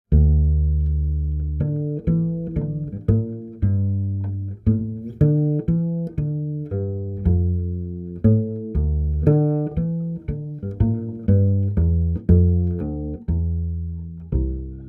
bass play music; gamerip; The Sims 2

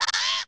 harpie wind up shot; enemies

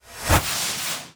Cast Multiple Attack; gamerip; Hornet

